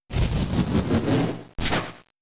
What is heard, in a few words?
Megahorn; pokemon; gen 3; attack moves